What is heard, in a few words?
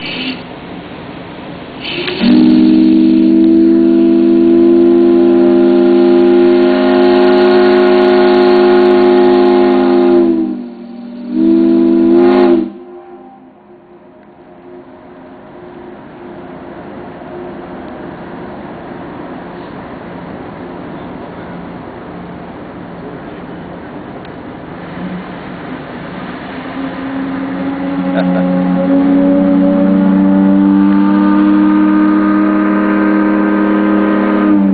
whistle, steamboats; grand victoria II; delta queen